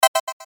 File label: CHIME SYNTH; Blastwave